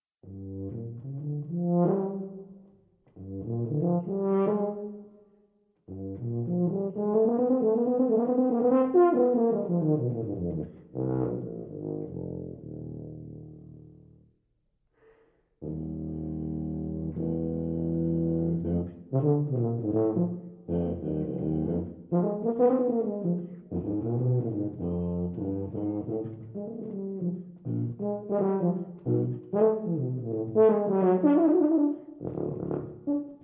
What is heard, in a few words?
happy blues